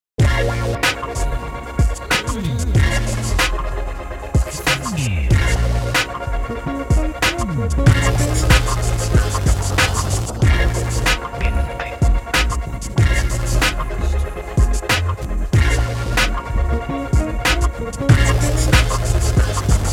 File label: Back Beat